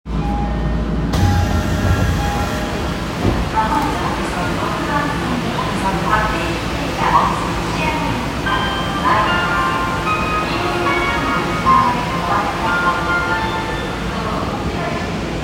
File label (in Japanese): 銀座線表参道東, train sounds